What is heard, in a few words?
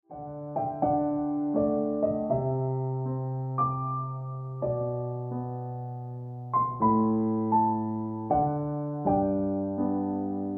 Piano Music